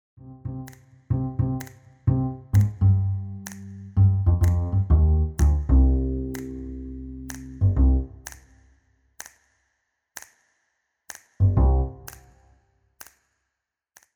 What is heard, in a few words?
accompaniment